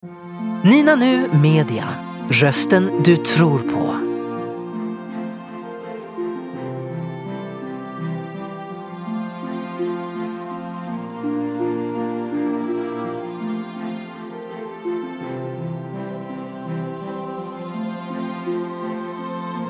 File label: classical vo